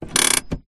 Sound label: transport car suv holden captiva handbrake on